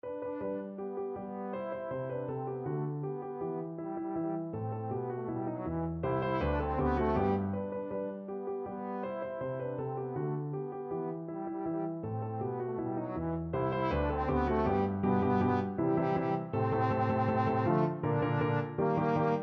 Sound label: Mozart Musical Joke TROM, trombone